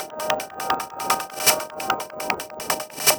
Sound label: 150 Perc, 150bpm, Nu Dance Electro; Combi Loops; Heres Johnny